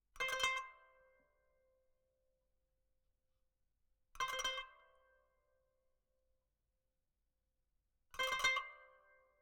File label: kanun